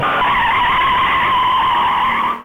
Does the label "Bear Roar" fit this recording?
No